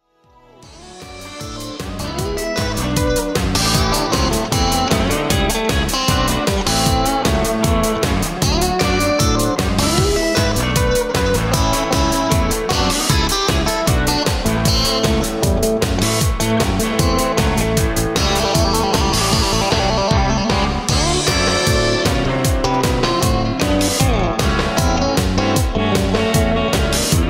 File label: 12 bar Rock